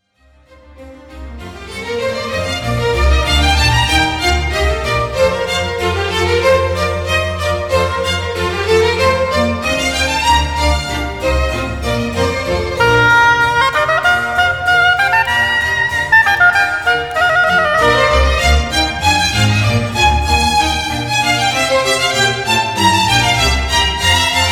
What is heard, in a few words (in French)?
Hautbois et orchestre; ALBINONI